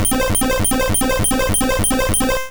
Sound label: Solar Beam; sfx; attack moves; pokemon; gen 1; rby